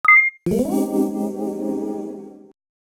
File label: beep beep